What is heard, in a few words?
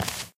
white tile, footsteps